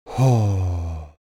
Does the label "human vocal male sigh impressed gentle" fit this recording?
Yes